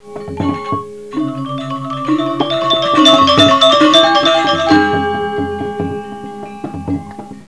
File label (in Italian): suonerie musica ritmica; rhythm